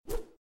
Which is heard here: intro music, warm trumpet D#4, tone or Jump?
Jump